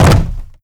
land, footsteps, player, wood crate

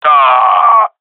death; Counter Strike; CS:GO; seal diver

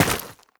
step, footsteps player debris

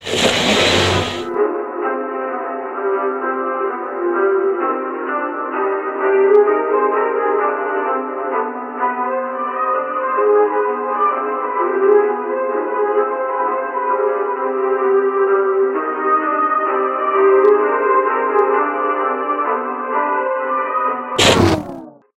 elevator radio, music; distillery

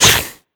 attack tell, headcrab black; creatures; vox

